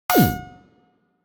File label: hit